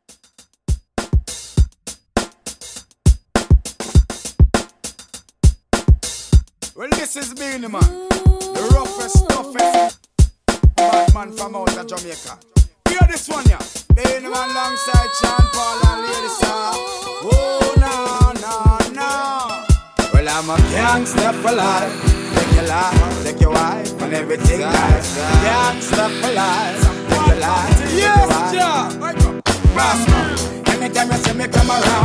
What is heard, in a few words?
Reggae Redrum 101Bpm